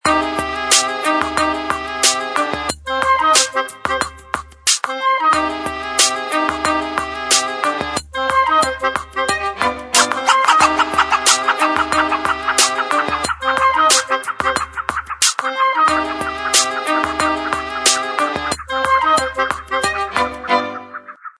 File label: Classical Chop, Ringtone; HTC Freestyle